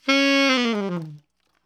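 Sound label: alto long fall C#3, sax; Giga Samples Collection; ALTO STEREO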